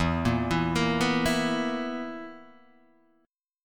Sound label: B Major 7th Suspended 4th E